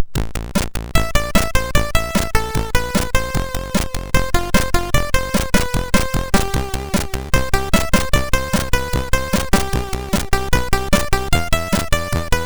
sid, MOS6581R2

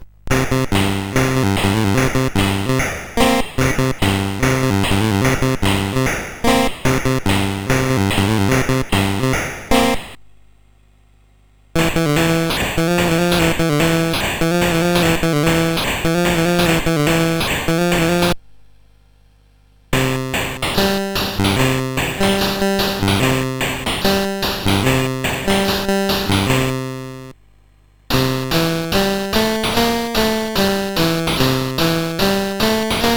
Rhythm, CSG8580R5

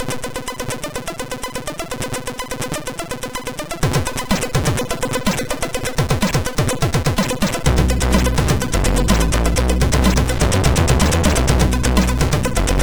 sid MOS6581; Love Spy

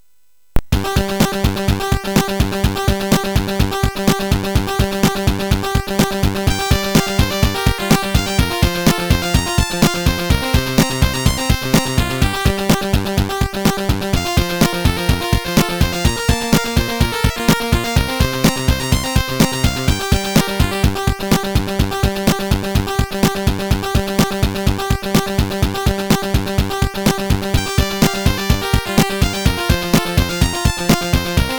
Sanxion Loader, MOS6581R3; Sanden Peter

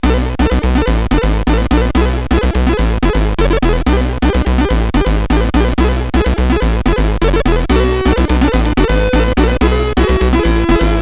intro CSG8580R5; Sonic Boom

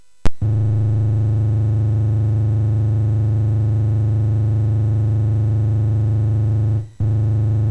Shuffle, MOS6581R3

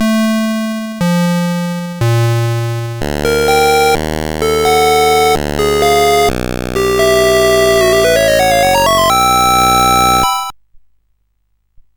Musicbox, sid CSG8580R5

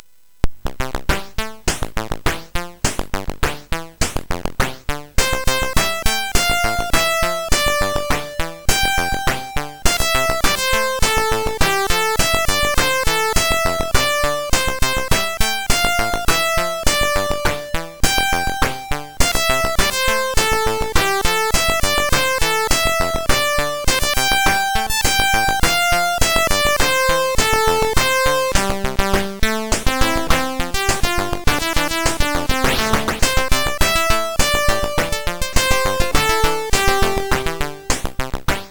Super Synth Sample MOS6581R4

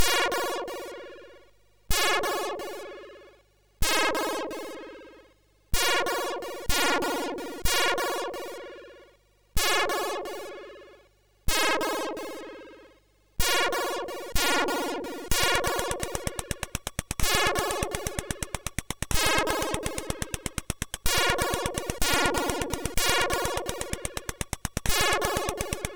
Noise; sid MOS6581R3

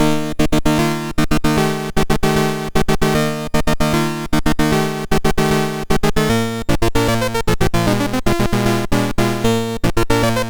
Fanfare, MOS6581R3; sid

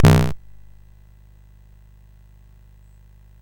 CSG8580R5, GAMES; Street Rod

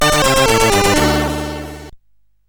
Intro Music, CSG8580

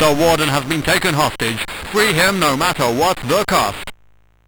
Jail Break, MOS6581R2

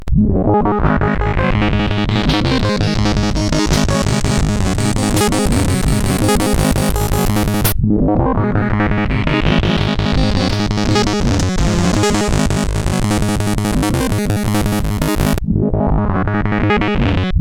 sid CSG8580; CSG8580R5; Essentials tune 01

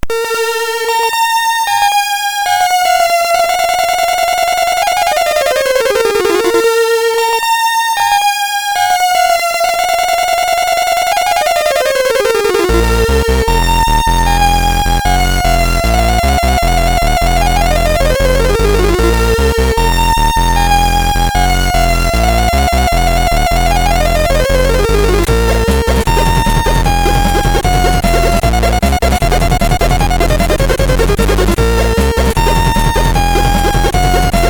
Intro Tune, MOS6581R4

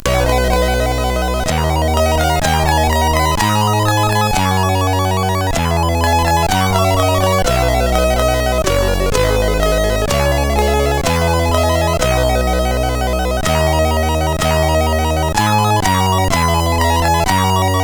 Cover, CSG8580R5; sid; hvsc

